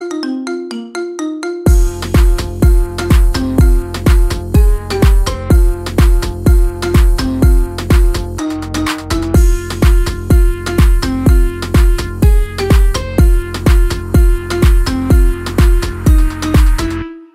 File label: Marimba Remix